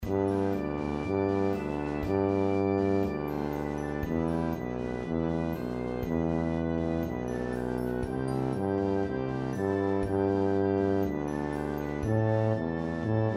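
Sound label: tuba in E flat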